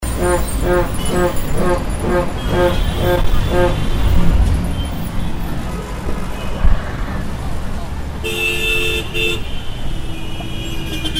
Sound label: cst traffic, sounds of mumbai